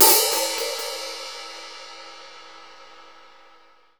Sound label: HH OP, Drum Dry; East Collexion; Drum 1